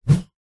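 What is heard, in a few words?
large stick bamboo swing swipe whoosh air; zapsplat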